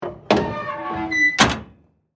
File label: Metal Hatch Close, Scifi, Metal, Hatch, Close, Rocket, Ship, Door, Science, Fiction; Spacecraft; BlastWave